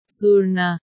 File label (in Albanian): zurna